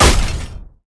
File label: metal heavy chop metal